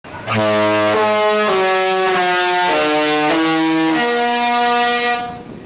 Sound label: horn